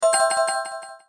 Combo

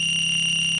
GAME LOST

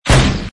gun, gunfire; fishing3

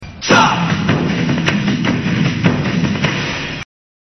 gundong

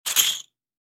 Add Score

